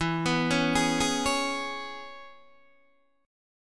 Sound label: E 6th, down, Guitar, Open D